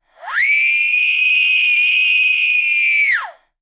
Human Female Scream Alien Other Worldly

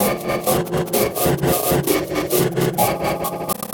Loop - 128 bpm, Stretchmangled; 99Sounds; Mangling Audio

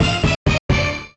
jingles HIT; Kenney's Sound Pack; Music Jingles

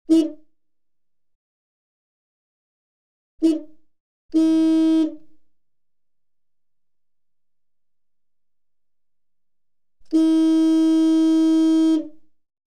Car, Interior, Horn 4 Blasts; Auto; BBC Sound Effects Library

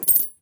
Coin Slide Carpet; Shapeforms; The Mint – Coins and Money

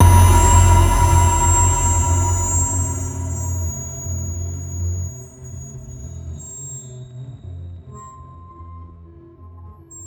Disturbed, Cinematic Impacts; 99Sounds; Rumore

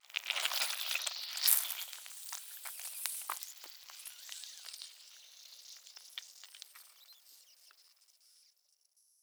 GORE Squeeze flesh with squealing air pressure

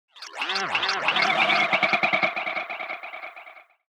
Tech, Weird Side Samples

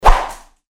Fighting Swing Sound; FXHome

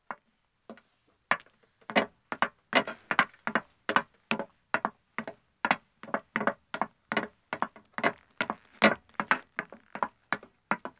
Metal Stairs Descending, 1 Wom, Footsteps; BBC Sound Effects Library; Footsteps II

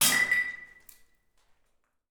Glass Smash; 99Sounds; The Warehouse

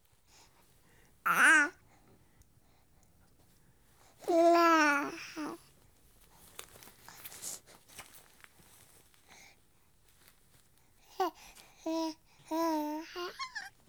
Week Old Boy, Happy Sounds During, Babies; BBC Sound Effects Library

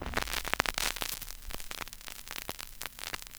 vinyl crackle; 99Sounds; Vinyl Noise SFX

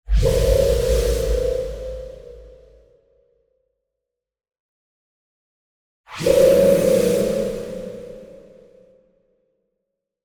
danger appearance swooshes; The Sound Pack Tree; The Whooshes & Impacts Pack

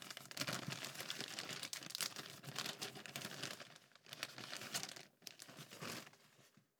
Coffee, filter, remove from plastic bag; UberDuo; The Home Barista

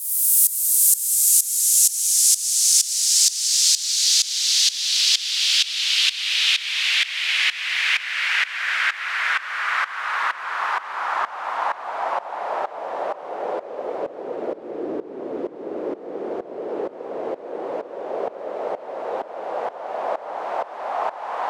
Transition Sweep, White Noise

